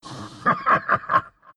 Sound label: laugh; Chaknight